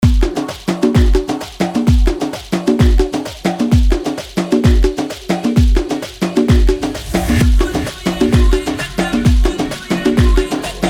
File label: 130 bpm